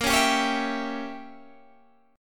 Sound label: Bb Diminished 7th Bb Guitar Open G; Bbdim7 strummed